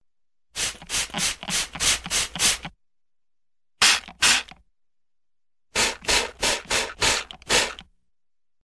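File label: sound of aerosol sprayer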